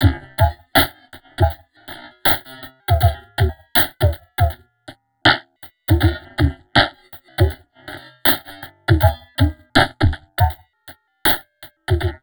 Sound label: Drum Loops Crazy Processed